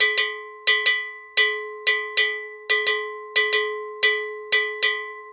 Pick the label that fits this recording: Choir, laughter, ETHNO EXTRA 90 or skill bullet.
ETHNO EXTRA 90